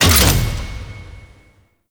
challenge complete; Veilbreaker; Kahl

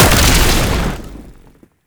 Sniper Lightning Strike, Weapons; Warframe; Sentient; Caliban Prime Sniper

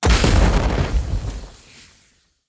Fire Blade Projectile Impact; Characters; Enemy; Abilities; Sinecure; Banneret

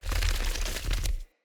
Stone Move Large Stop; Puzzles

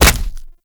Punch Impact Flesh; Warframe; Infested; Kick Punch

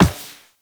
Walk Metal Perforated, footsteps; Warframe; Operator

